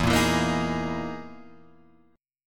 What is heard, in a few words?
E Major 7th Flat 5th